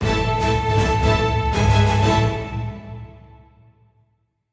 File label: heroic forte end